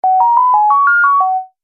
Multimedia Music

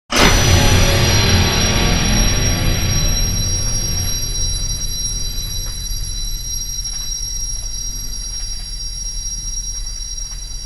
Spaceship, Power, Down, Scifi; Sound Effects:Science Fiction; BlastWave

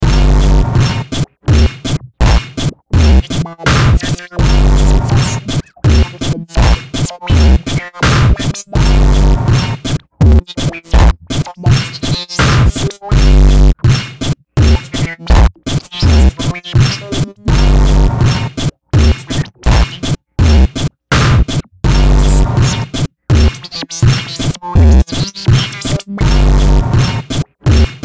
BEAT GLITCH, Beat Glitch Hip Hop; Miscellaneous Loops

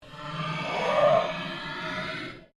Ambient Pulse Ghost Chatter

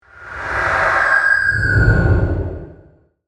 Whoosh Ghostly, Transition; Scream, Production Element, Imaging Element, Accent; Whoosh Sound Effects; BlastWave

